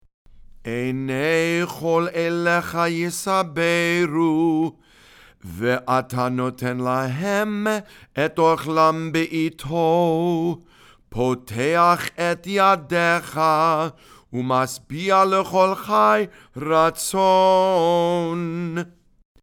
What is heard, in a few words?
ashrei read and sung